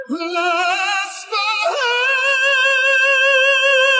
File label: Chest C2